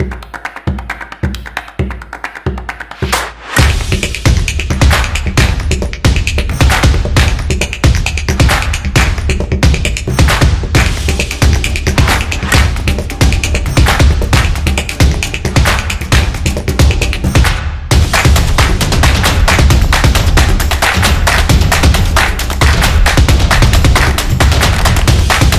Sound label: Clapping Drums; football promo